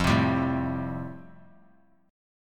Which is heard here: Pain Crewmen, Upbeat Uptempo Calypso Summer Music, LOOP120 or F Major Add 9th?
F Major Add 9th